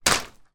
pop up plastic framed goal small down on hard surface; sport; zapsplat